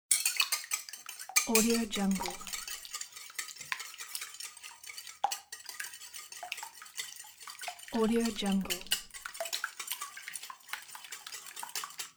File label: whisking eggs; applehillstudios